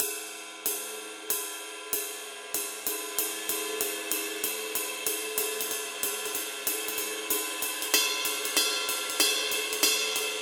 21 medium ride pattern; paiste; masters

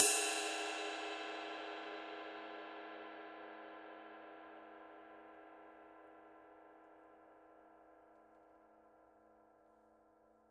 22 dark crisp ride body, paiste; masters

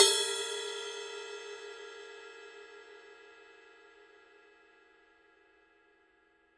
ride bell; paiste 2002

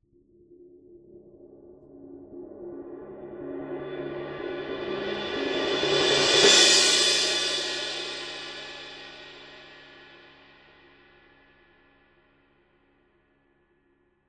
20 extra thin roll; paiste; masters

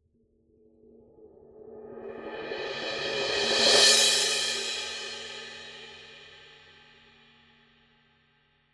paiste signature 17 mellow crash roll

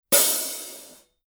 900 series 15 heavy hi hat open, paiste

